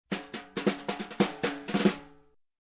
Fast Jazzy Snare Groove

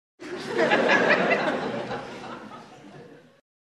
Group of Elderly Woman Laughing

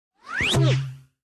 Funny sucking sound

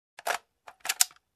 Machine gun magazine in

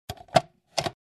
Plastic container close

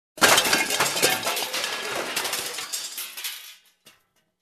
Smashed Debris Sound

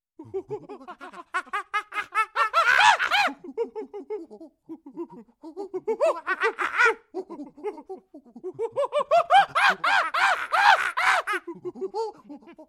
Monkeys Chattering

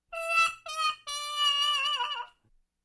Fail sound effect muted trumpet

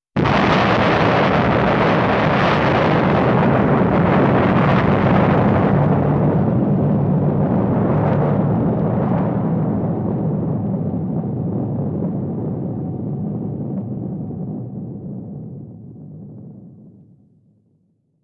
Huge Bomb Detonation

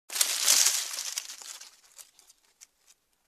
Rustling Leaves

